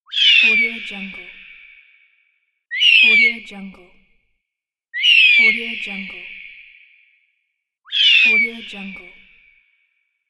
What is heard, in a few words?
eagle